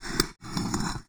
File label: fs Dishes Depart; netent